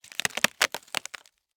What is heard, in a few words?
Cardboard, honeycomb, crush, collapse, short; Eiravaein Works; Vaeyan IV